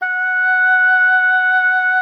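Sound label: F#5, oboe d'amore; Xsample VOL-7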